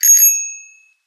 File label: BYCICLE; pictcode